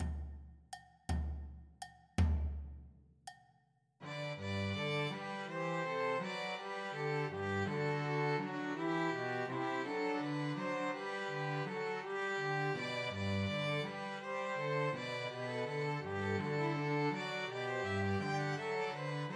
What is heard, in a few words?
Christmas Trios for Two Violins and Cello; Sussex Carol